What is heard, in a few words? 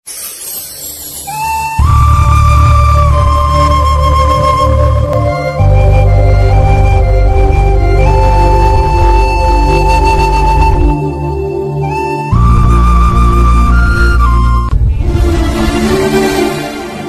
bgm sad love bgm ring tone; arya 2